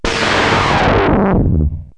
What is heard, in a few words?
Particle Disintegrator, Cartoon Space Zaps; ABOOM! - Wild and Wacky Sound Software; 1,000 Sounds (16-bit)